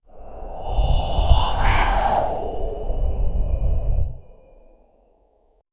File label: eerie demonic whispers; psychological